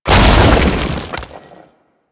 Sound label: Earth Crack; Condemn; Warframe; Priest